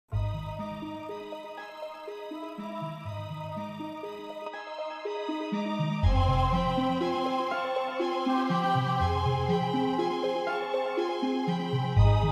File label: Underwater Passage; atlantis the lost empire 2001 playstation